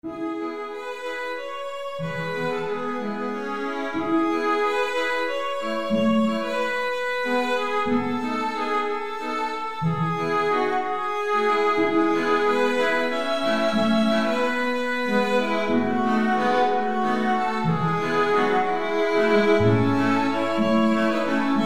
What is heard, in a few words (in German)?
streichquintett